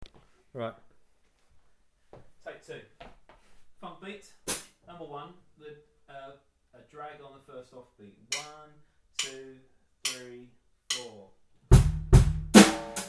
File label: Funk beat with drag